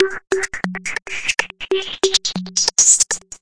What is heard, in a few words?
140 phasing tiny funk machine, drum loops and breaks